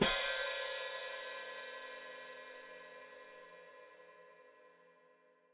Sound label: Hat Closed Open, Hi-Hat